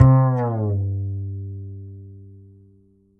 Upright Acoustic Bass, 1ST POSITION; Larry Seyer

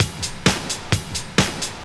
drum 130 straight; Techno Trance Essentials

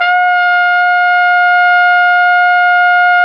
Flugelhorn; Roland L-CDX-03 Disk 2

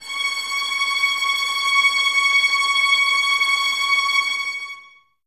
Violins Tremolo C#6; Miroslav Vitous; 11 Violins

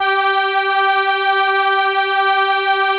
ARP G3, STRENS; Sonic Reality; Vintage Timetraveler

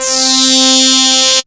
SWEEP C3; Best Service Alpha Dance vol.2

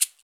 Afro Shakers, Shake & Rattle; Roland LCDP14 Africa VOL-2

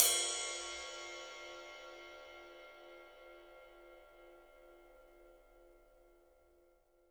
PW RIDE, RIDE CYMBAL, 3D Drums; 3D Sound

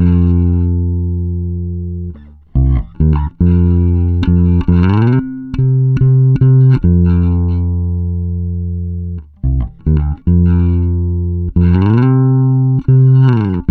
PARIS 70, Bass Grooves; USB Soundscan vol.30; AKAI

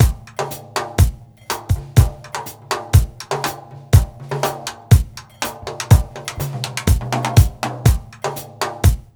BEAT, Roots Disco; USB Soundscan vol.32; AKAI

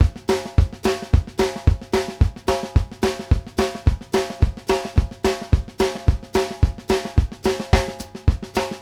STRAIGHT, TRAIN 108; Sampleheads; New York City Drumworks VOL-1; SP TRAIN 108

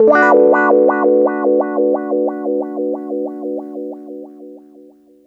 089 WAH PAD, Electric Guitar Loops; USB Soundscan vol.04 - Electric & Acoustic Guitar Loops; AKAI

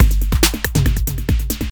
Break Pattern, Drums

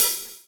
LOOP 104; AMG Gota Yashiki Groove Activator; JR.LOOPS 104

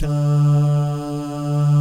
DAH, Vocal Jazz; ILIO

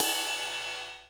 Yamaha RX-120 Ride Cymbal Edge

